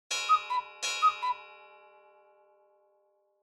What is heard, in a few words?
Cuckoo Clock Bell Mid